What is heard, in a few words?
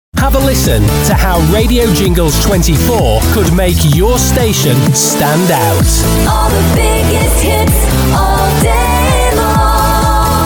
Sung Jingle